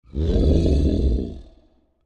Creature Large Growl Aggressive; Monsters; Horror; BigRoomSound

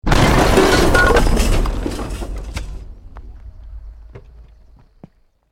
BUILDING COLLAPSE SCAFFOLDING SMALL; Created dramatic effects of buildings collapsing or breaking

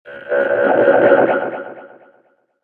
Slow Robot Squelch; Robot Sound Effects; science fiction sound effect

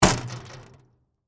Impact Metal Sign, Street; Crash Sound Effects; Crashes (Sound Effects:Objects)

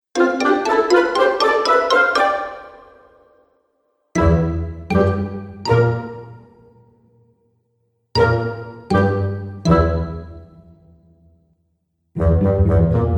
Cartoon Fun Musical Collection

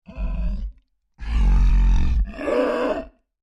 Creature Large Breaths Grunty; Scary Breathing; Horror; BigRoomSound

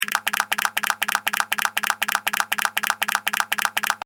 Timer Loop, alarm; electronic; Loopable

